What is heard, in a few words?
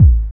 DR. LOOP, DRUMS; X-Static Goldmine 3